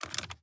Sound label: Reload Mvt B, weapons; ares